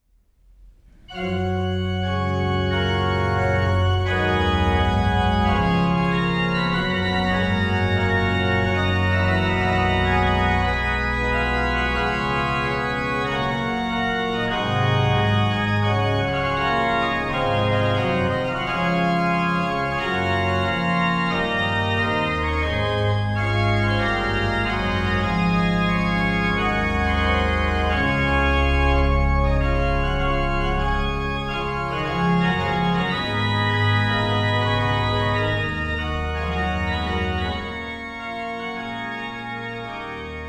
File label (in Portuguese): Orgao Arouca; PureSound